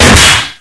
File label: vent drop, metal; hl2; impact